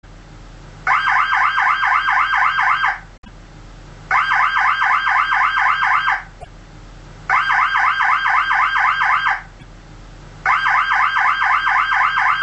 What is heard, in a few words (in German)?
Polizeisirene Anhaltehorn